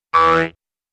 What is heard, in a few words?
Bounce Jews Harp Air